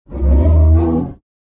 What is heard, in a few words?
monster roar long; soundscrate